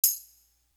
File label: Tambourine; Sherezade